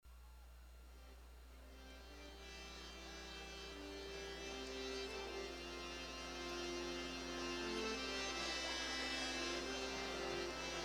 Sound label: Bhajans Hare Krishna Kirtan; Bhakti Sangam Festival 2012; HH Bhakti Bhringa Govinda Sw; Ukraine